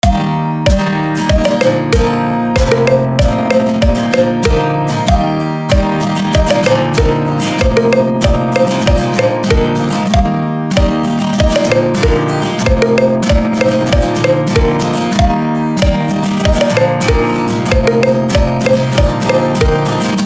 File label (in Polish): wesola piosenka